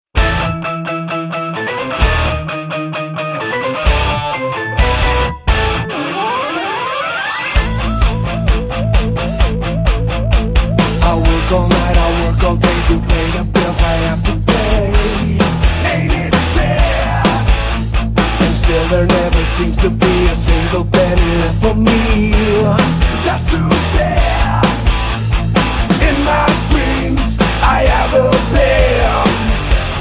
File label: metal cover